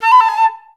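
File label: flutes A4; Best Service; Peter Siedlaczek's Advanced Orchestra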